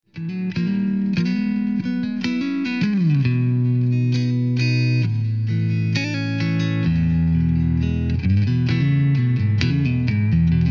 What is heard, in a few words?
Neck Clean